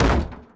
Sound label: bump, jetski; giants